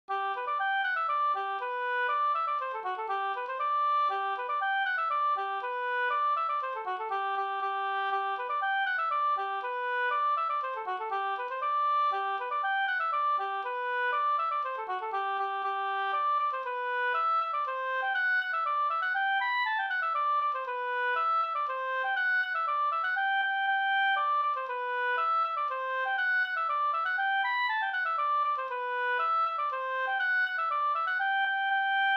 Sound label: polka